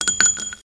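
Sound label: SFX shotgun shell; Blood: Fresh Supply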